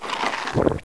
mooncake eat

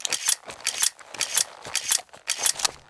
reload shotgun

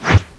axe slash; skullaxe

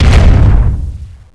boss footstep, zombi

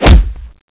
hit

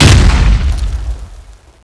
rocket exp, comanche; nst monster

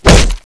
combat knife hit

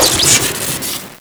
crit shot; chicken fortress 2

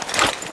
bazooka draw

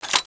m82 clip out

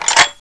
m400 foley

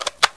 xm8 foley

